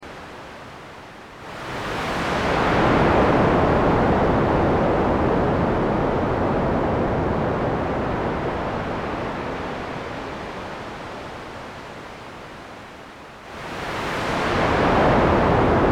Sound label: MS-20 mer; la mer, sur une plage de l'Atlantique, avec ses grands rouleaux; Démo audio des fiches didactiques de sons analogiques détaillées dans le livre